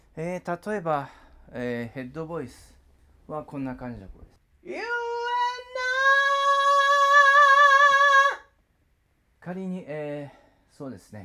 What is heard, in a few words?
headvoice Bflat